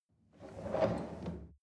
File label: chair wheel roll on floor soft squeak